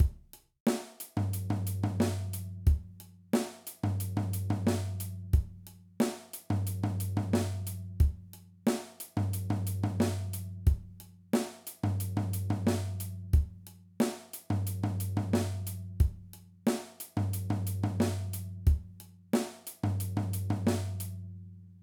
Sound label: Groove, drum